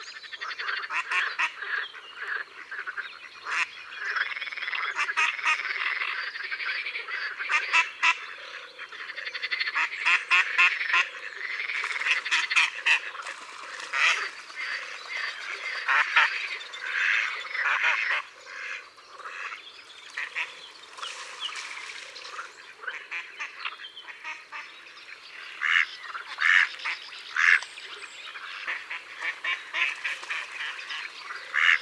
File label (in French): Canard colvert; abc de la nature